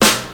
crispy steel snare drum sample a key, Royality free snare sample tuned to the A note